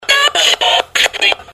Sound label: come and walk with me, sung